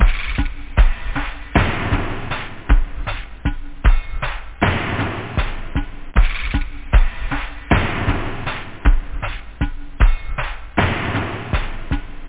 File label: love beat; OctaMED SoundStudio V1